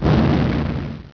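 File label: EXPLOSION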